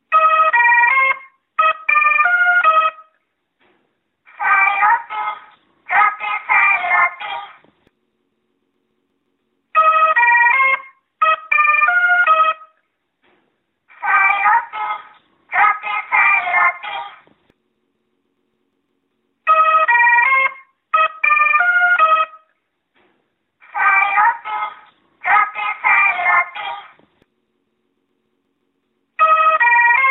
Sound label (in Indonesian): suara burung beo master roti